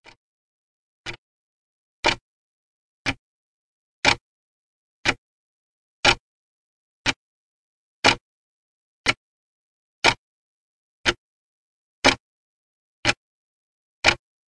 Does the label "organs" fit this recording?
No